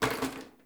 Janitor Plastic Bottle Water Drop; Ambience; Gameplay; Warframe; Nineteen Ninety Nine

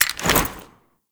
Invisibility Throw; Warframe; The New War; Drifter; Abilities

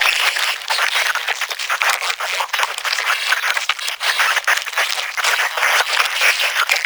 Maggot Breathe Loop; Enemies; Vocals; Warframe

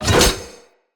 Heavy Whoosh L to R, Swords; Weapons; Player; Mockery Weapon Common

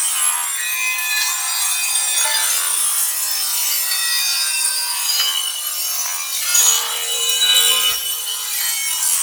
Boss Crystal Beam Loop; Weave Boss

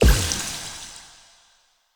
Blood Lust Hit; Fey; Champion

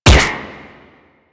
UMP Shot; Warframe; Weapons; Corpus; Prisma

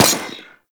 Rifle Fire; Warframe; Venus; Venus Hyena Astro; Weapons

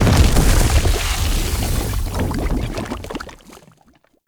Lava Burst; Ambience; Props; Warframe; Tau; Circles Of Hell

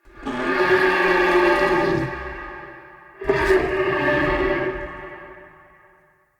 stag wild exclaim call; Powers; Stag Pact

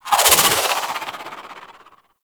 Charged Proj; Weapons; Warframe; Tenno; Wraith Sidearm; Epitaph Prime

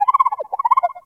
Valley Water Creature; Warframe; The New War; Drifter Camp Valley

